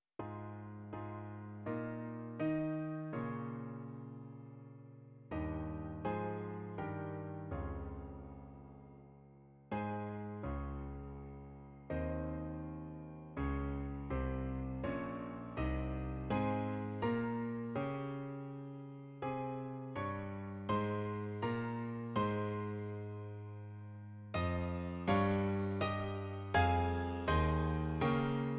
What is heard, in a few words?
Piano Melody